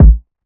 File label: Asmr Kick; Vignette Kit